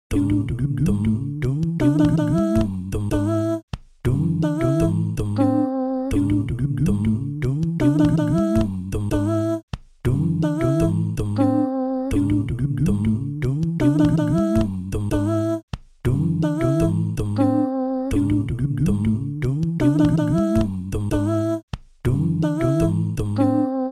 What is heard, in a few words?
Doo Dum Bar loop